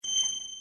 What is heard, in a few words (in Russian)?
Звук нового сообщения